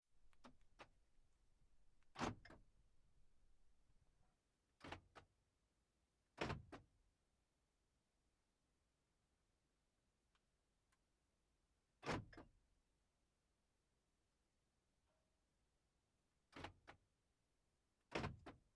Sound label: car door lock